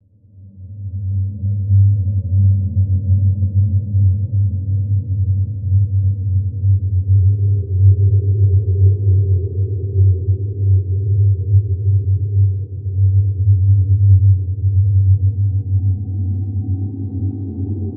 Medical dark ominous structure with low pitch rumbles and slow howling effect; underwater